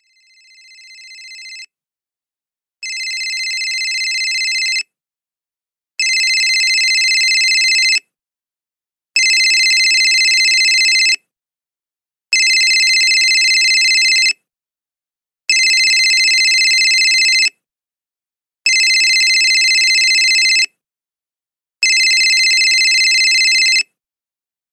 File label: Progressive Ringer